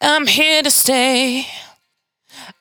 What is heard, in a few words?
92bpm Bb, Velvet Vocal; Zero-G